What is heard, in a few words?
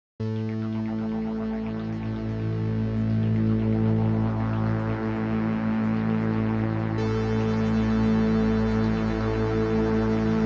polycom cycling through